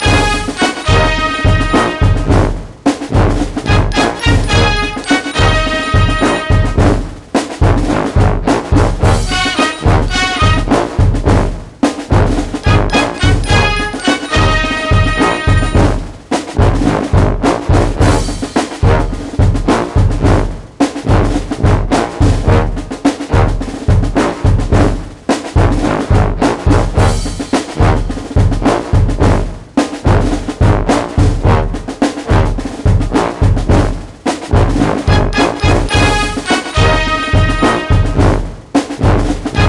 Music American football

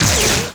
Explode; Touhou Jitsuzaisou Dream Logical World

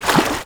Splash

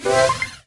Music

